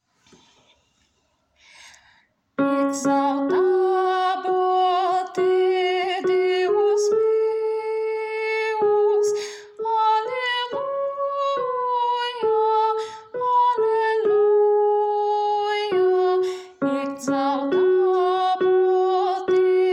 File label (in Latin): exaltabo soprano